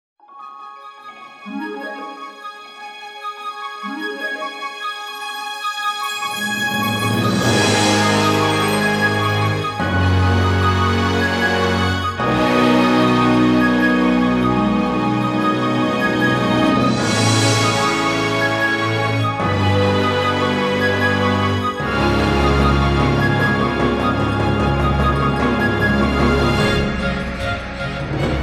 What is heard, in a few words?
GTR